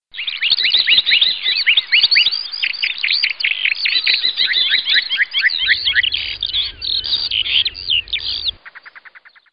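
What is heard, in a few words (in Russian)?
zvuki chirikanya ptic, bird chirping sounds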